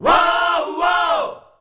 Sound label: "uuaauuaah", shouts; Best Service; Hyper Dance